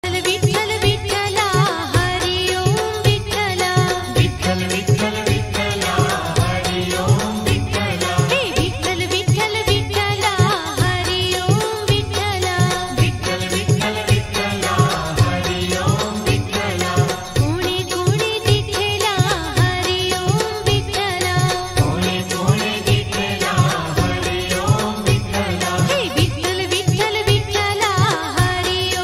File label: marathi ringtone